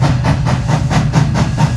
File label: loko; Mafie 1